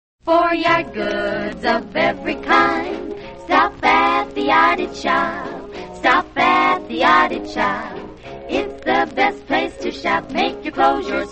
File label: radio jingles; yard it shop; allied radio artists